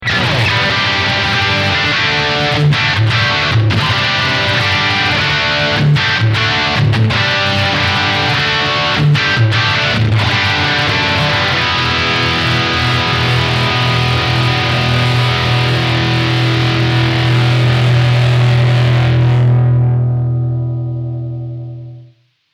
High Gain Low Focus High

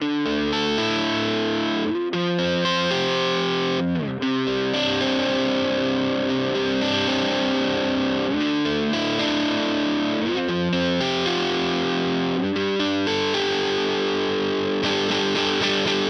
Epiphone SG Both

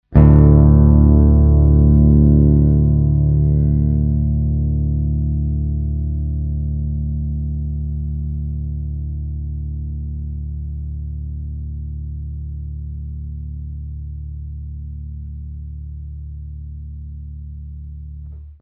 sustaaaaaain

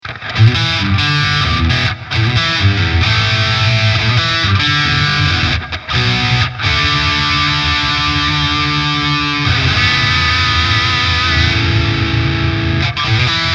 Modern Midscoop

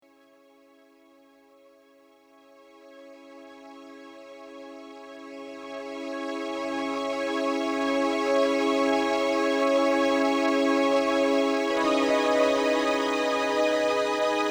Opus 3 Strings

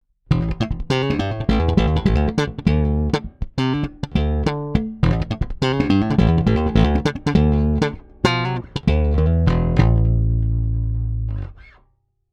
Markbass MB58R CMD 102 Pure Slap Clean DI

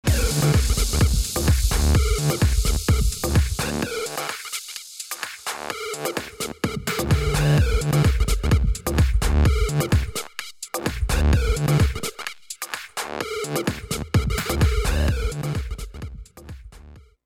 VCF HP Mild

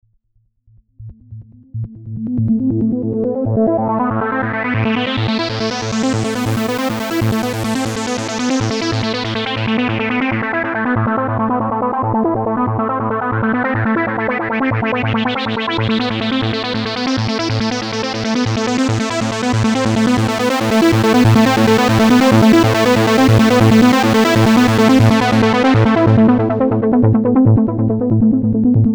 Seq Mod

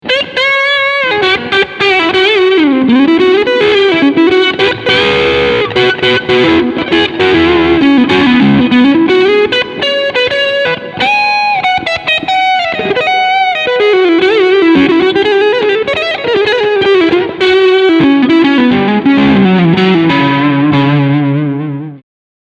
Crunch Front SC

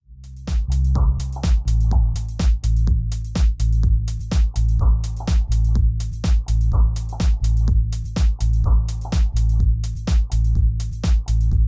looproll

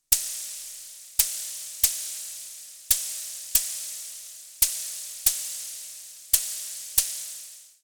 Behringer Neutron Cymbal

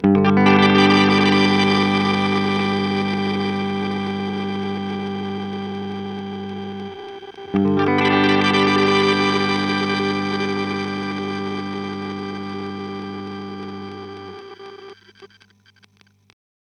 Chase Bliss Blooper Reverse Pitch Scrambler

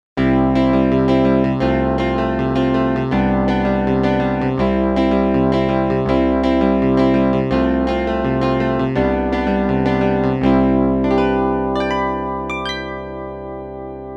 Roland MKS-10 Planet-P piano chorus

